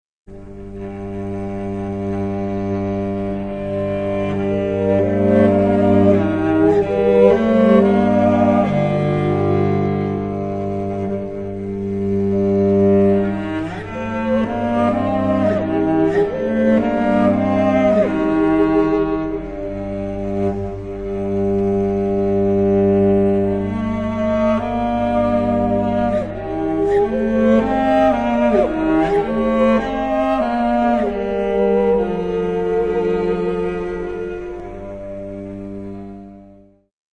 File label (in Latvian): gramata cellam solo pianissimo